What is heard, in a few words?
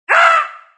Scream